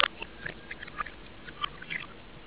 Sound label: reverse audio